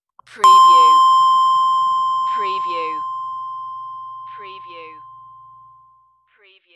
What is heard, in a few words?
Long Beep